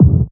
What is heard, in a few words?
Noise kick; Roland MV8800; SONIQ DRUMS